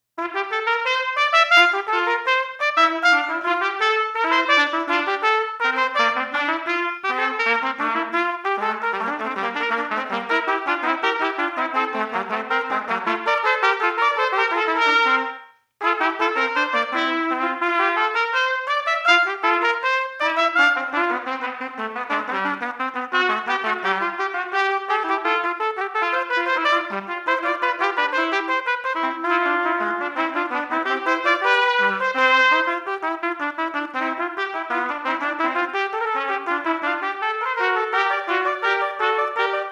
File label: Sonata without click; Sonata 1 1st Mvnt